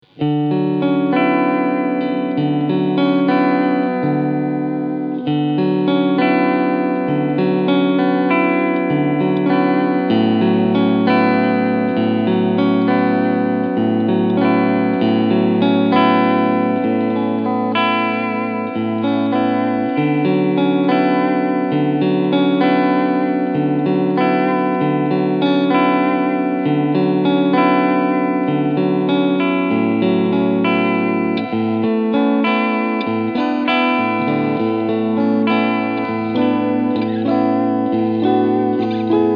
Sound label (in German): Clean Middle Steg